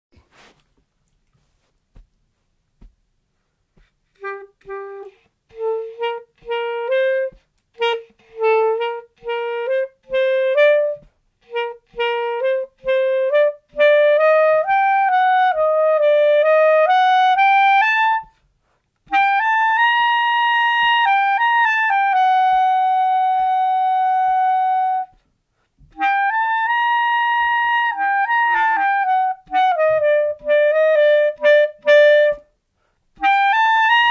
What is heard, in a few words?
Clarinet excerpt